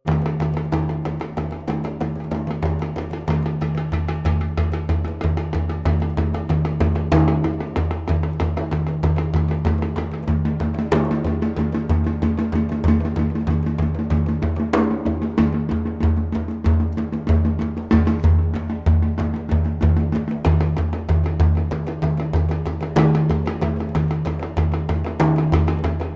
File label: drumming; Freunde